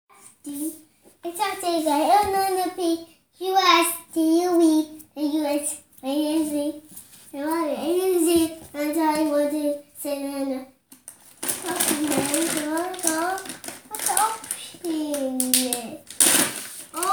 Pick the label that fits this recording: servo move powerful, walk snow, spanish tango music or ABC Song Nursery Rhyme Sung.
ABC Song Nursery Rhyme Sung